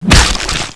hit, zombie

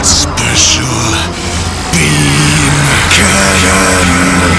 special beam cannon; piccolo

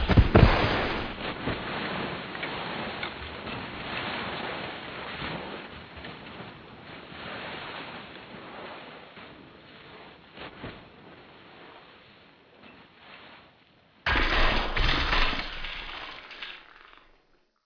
grunt dragged and killed

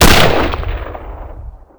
fg42 shoot; rtcw

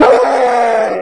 trooper, die; dn3d r

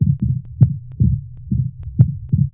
steps

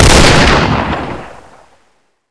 Custom Desert eagle shot, weapons

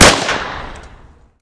smg, weapons; HL2

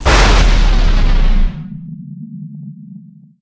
blast

